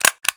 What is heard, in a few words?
Stapler; Office Supplies; 1001 Sound Effects